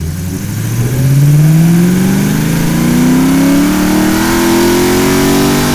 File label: ENGINE ACCEL